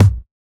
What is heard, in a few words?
Kick, Trap Foundations Kicks